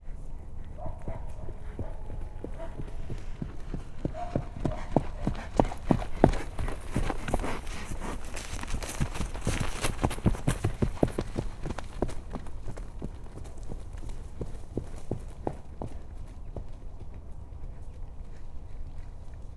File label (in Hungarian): érkező lovas majd visszafordul, ló; állathangok; paraszt udvar